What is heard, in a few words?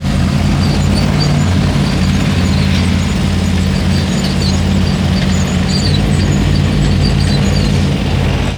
running tank